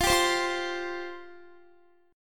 F Suspended 2nd F down Guitar; Fsus2; strummed

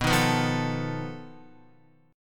B 7th Flat 5th B Guitar; B7b5 strummed; JGuitar

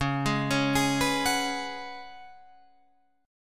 Db 7th Suspended 4th Db down Guitar Drop D

